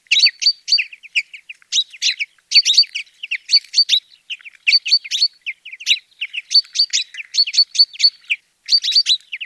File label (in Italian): fringuello